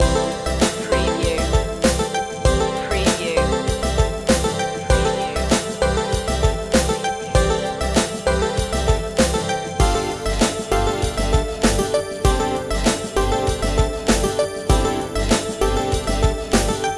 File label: late night beach party loop